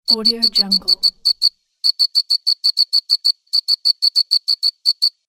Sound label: cricket